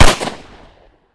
glock18; ghost ws; weapons

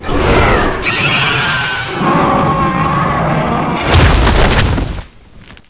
boss death; zombie plague

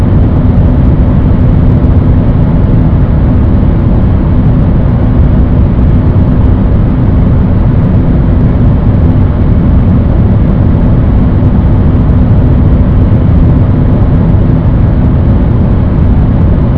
wind

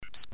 key; sadpuppy

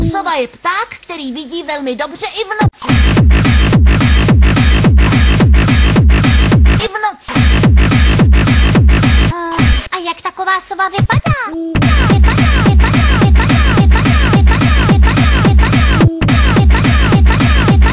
music

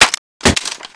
clip out, weapons; prototype5

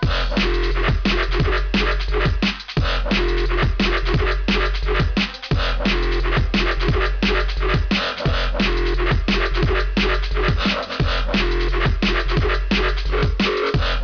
music

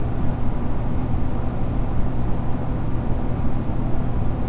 industrial loop, ambience

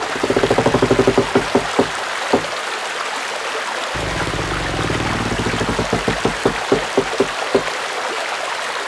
water wheel

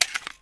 clip out, weapons

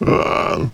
zombie pain

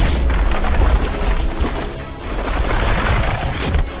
smash; hulk

